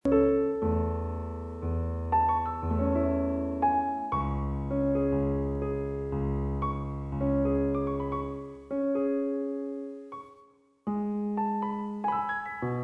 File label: Piano